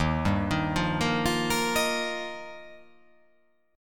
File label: D sharp Major Add 9th